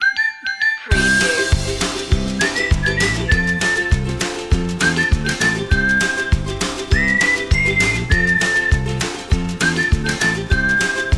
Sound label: upbeat ukulele